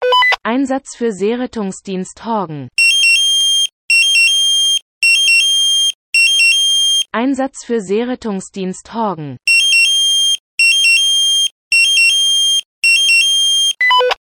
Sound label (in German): Alarmierungston; SRD Horgen Tel